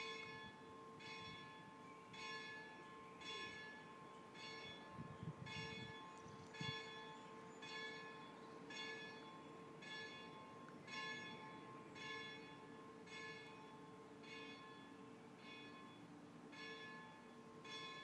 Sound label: 5 minute bell